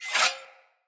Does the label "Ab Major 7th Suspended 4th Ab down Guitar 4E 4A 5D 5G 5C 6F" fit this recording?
No